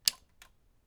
Brodcast VTR Power On; SFX; IRL Recorded

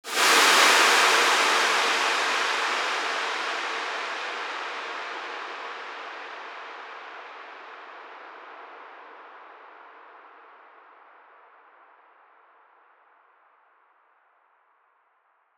Downlifter; SFX; Downlifters

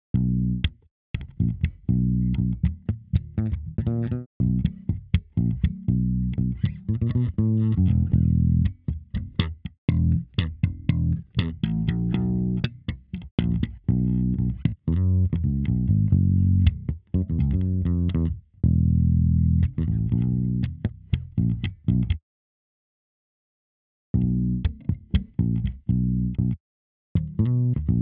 Bass; Reaper project

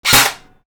Airsoft Shot (Stereo); SFX; IRL Recorded

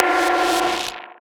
Klank; SFX; Ambient - General

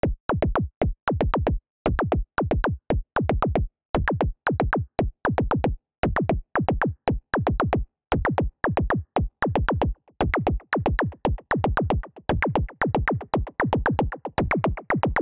Laser, Risers Uplifters; SFX

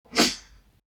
Woosh

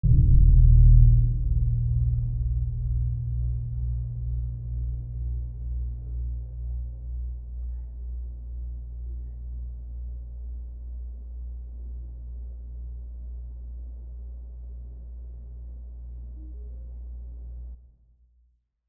Reverb Bass, Impacts Bass; SFX

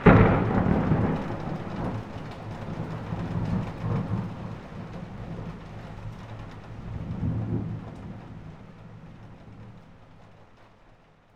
Thunder

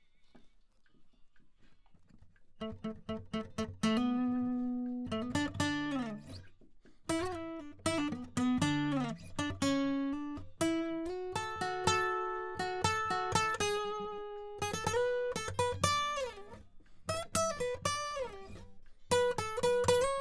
Solo; Reaper project

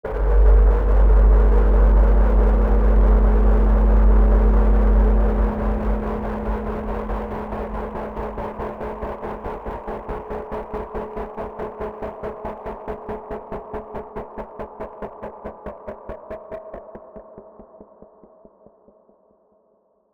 Underwater; SFX; Ambient - General

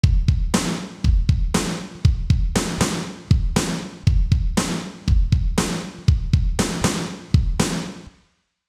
Acoustic, Loops

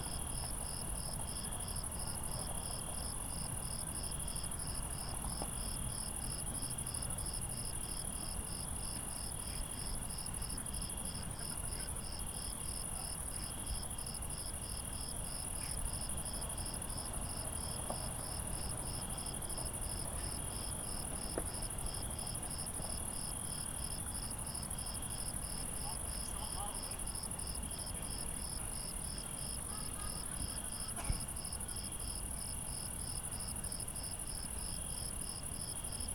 Night Crickets; SFX; IRL Recorded

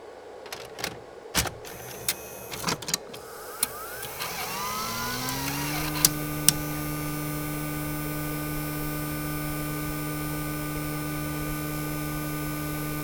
Sony DVW Tape Insert & Eject; SFX; IRL Recorded

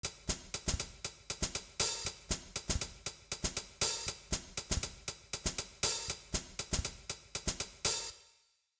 Acoustic Cymbals, loops

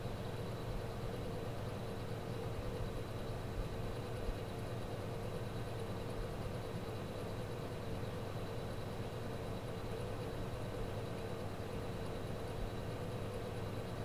Fan Rattling; SFX; IRL Recorded

